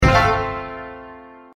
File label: hit; Golden Shanghai